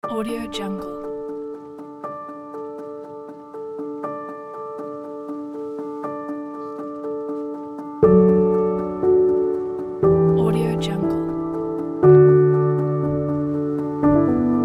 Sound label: piano scenery